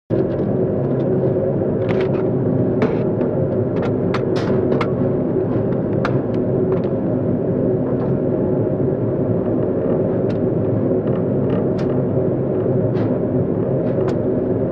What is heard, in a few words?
ambient noise air